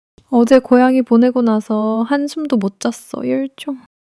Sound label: crying